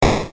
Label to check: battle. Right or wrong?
Right